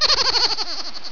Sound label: mouse laugh mini